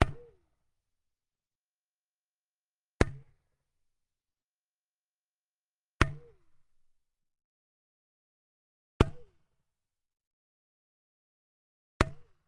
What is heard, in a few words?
potato gun shot without canon; fvtt sonniss gdc audio